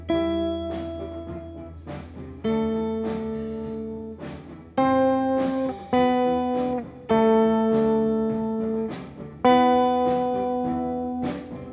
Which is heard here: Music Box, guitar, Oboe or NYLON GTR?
guitar